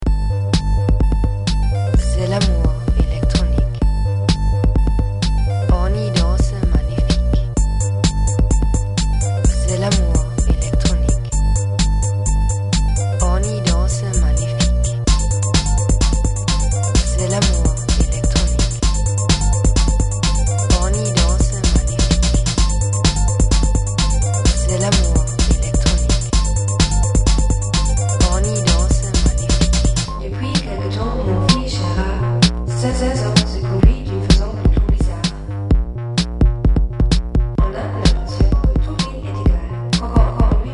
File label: EDM